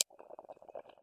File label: tone; seasonal